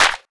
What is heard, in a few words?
clean clap sound d sharp key; percussion; single hits one shots